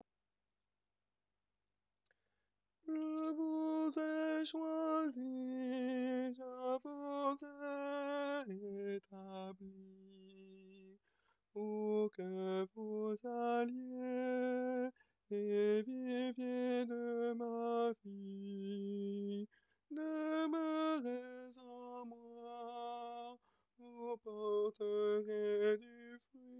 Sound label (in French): Je vous ai choisis tenor